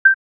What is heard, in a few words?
Beep; Nokia; Nokia Design Team; Tones 2014